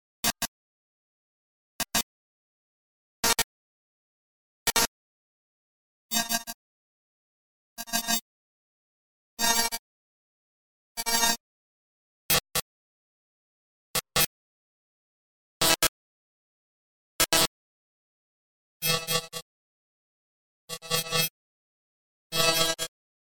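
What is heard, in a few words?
series of interference like a signal break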